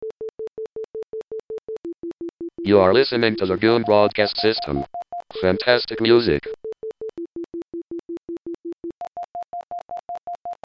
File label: jingle